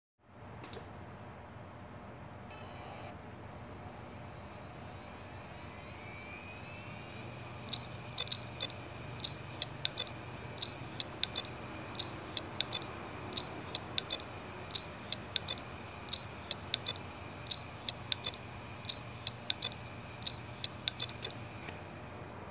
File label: Heads SEAGATE, damaged hdd sounds